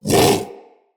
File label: Vocal Bark, Hunting Critters; Characters; Predasite